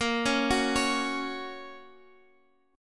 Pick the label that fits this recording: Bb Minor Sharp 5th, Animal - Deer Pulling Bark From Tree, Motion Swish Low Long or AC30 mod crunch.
Bb Minor Sharp 5th